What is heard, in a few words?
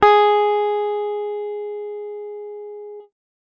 guitar electronic, clean electric guitar